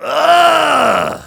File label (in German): Heavy jeers, de